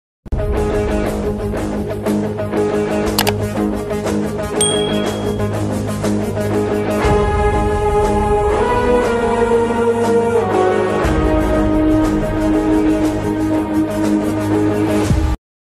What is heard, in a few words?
anthem song bgm ringtone telugu movie bgm ringtone; Ghani